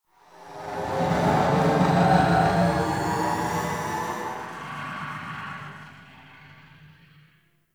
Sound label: Inhale Interior Small; Ambience; Warframe; Sentient Lair